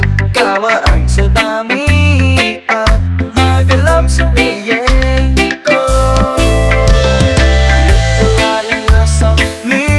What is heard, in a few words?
reggae